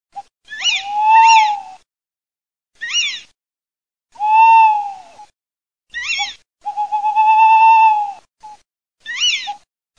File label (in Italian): ALLOCCO CIVETTA